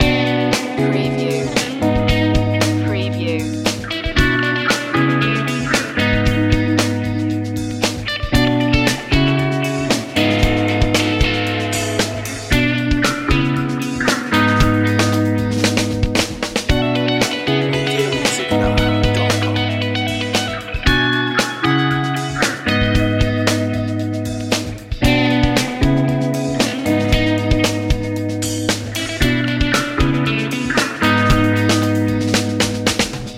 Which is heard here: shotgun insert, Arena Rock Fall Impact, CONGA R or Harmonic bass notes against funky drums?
Harmonic bass notes against funky drums